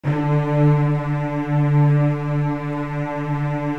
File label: Virtuoso Strings, D2; ILIO; Kirk Hunter Virtuoso Strings VOL-2; 90 s Sample CDs